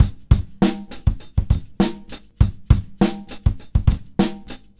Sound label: Combo, R&B Drums